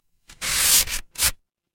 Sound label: paper fabric tear